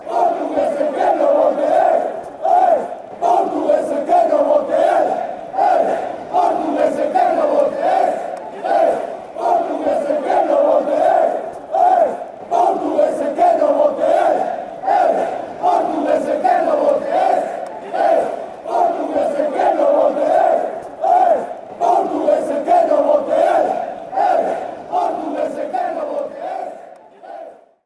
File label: portuguese, chants